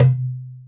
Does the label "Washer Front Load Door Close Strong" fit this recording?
No